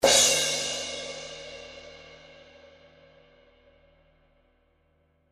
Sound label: 18in Custom Fast Crash, cymbal